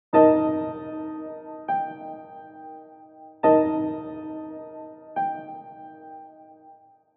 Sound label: bottom chords